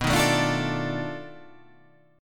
B 7th Sharp 9th Flat 5th B down

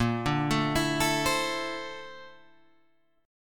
Bb Major Sharp 11th, Guitar, Open G; 8,7,7,9,10,10

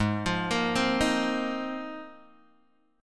Ab 6th Add 9th Ab, Guitar, Open G

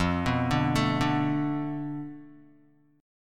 F 6th Flat 5th F Guitar; F6b5 arpeggiated

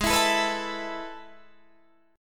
A Major 7th Suspended 2nd

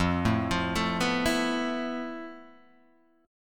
F Major 7th Flat 5th; arpeggiated; FM7b5; 1,0,3,2,0,0; JGuitar

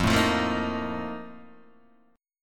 F 11th, Guitar, DADGAD

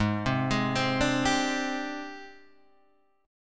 Ab Major Sharp 11th Ab down Guitar Half Step Down; AbM#11

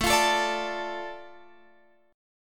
A Suspended 4th Sharp 5th, Guitar Open F; Asus4#5; strummed; x,16,17,16,17,16

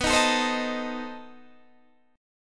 B 7th Flat 9th B Guitar Open D

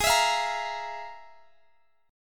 F Minor Major 11th

